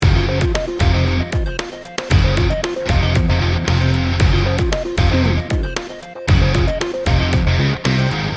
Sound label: bollywood action hero loop